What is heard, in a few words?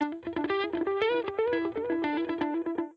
Rock Groove, ringtone